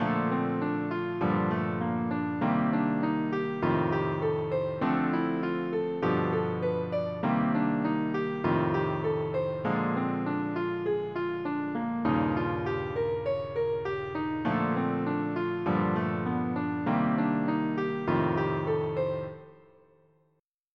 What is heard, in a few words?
rhythm changes